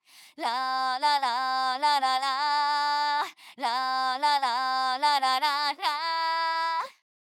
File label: PowerRock; teto sv2 review